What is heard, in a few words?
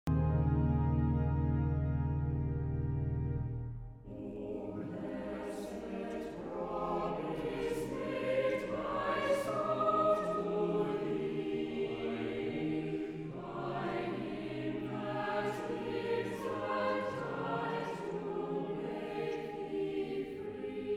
Choral Meditation O Blessed Prom; Somervell (Arthur); The Passion of Christ